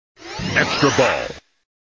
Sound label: Sound SFX Extra Ball; flipper; sttng